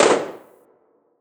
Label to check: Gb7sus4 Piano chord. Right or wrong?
Wrong